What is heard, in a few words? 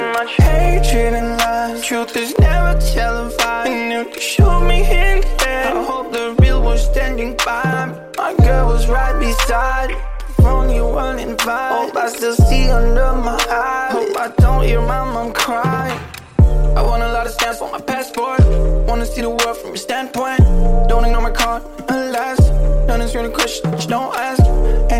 Ringtone